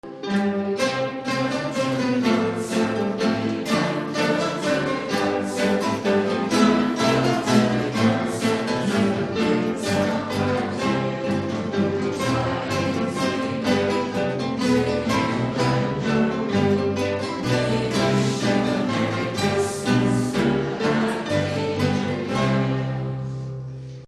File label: we wish you